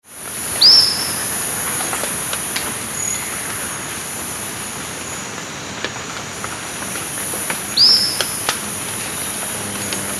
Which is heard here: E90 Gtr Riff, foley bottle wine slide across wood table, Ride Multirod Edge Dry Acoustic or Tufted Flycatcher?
Tufted Flycatcher